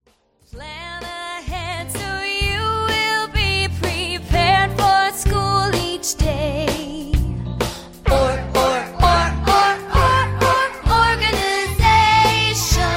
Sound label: Demo Album Track with Music and Vocals